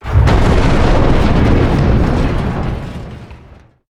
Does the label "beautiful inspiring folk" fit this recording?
No